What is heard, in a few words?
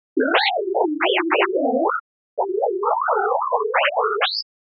transmission test